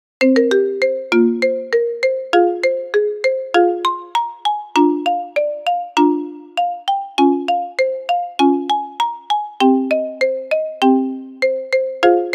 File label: Marimba Ringtone; Dragon Ball